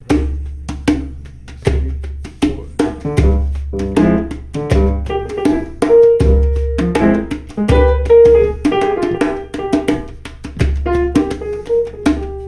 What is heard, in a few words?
dorian b2 groove; jazz; Mike Longo